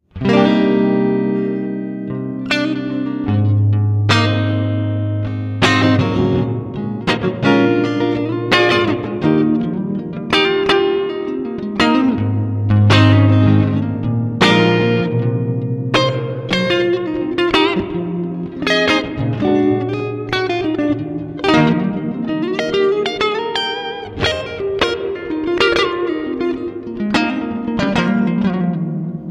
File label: clean mode st rev